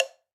Wood Block D Low; Latin Percussion; Drum Hits; Ableton; Factory Packs

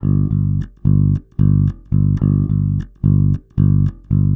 110 Pop Basic Bass G, E-Bass; Pop Rock

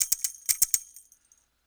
125 Pop, Shaker; Pop Rock; Percussion

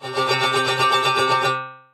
Synth Rhythmic; Synth Essentials; Collision; Run For Cover

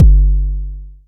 analog tonal, Kickbank

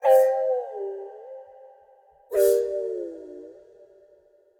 Wine Glass Chords C Minor 104 bpm; MIDI Clips; Tonal; Ableton; Factory Packs; Lost and Found

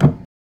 Wurlitzer G0; Keyboards; PM-200; Ableton Foundation FreePacks

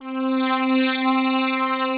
Butter Pad, Synth Pad; Ableton; Creative Extensions; Poli

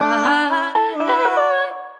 Sliced Melodies Root B, vocal; Sliced Vocal Phrases; Ableton; Factory Packs; Voice Box; Harmonic Vocal Racks

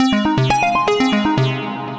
Ambient Starfield Arp, Synth Rhythmic; Ableton; Factory Packs; Synth Essentials

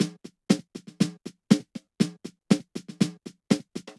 Hand Snare; EFX; Drum Kits; Ableton; Drum Booth

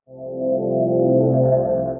Outer Rings Pad, Synth Pad; Ableton; Factory Packs; Synth Essentials

